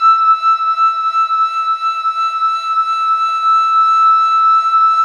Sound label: glass loop E, glass music; Ringling Bros. and Barnum & Bailey; Wii